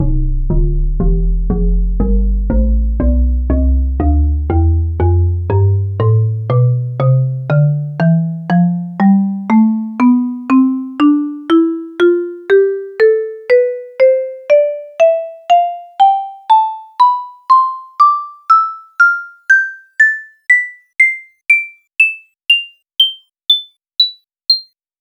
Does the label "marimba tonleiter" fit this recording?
Yes